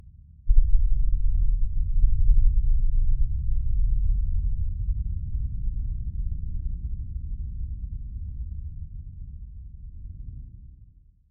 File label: Distant Shot Rumble